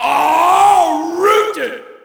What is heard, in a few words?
Shout, audience; UFC Tapout 2